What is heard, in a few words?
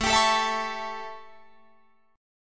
A 7th A, Guitar Drop D; chord